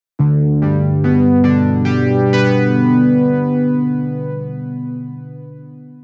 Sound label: Alpha Bells, jup8; Aphelion